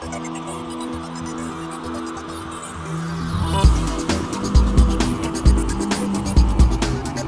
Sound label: Fill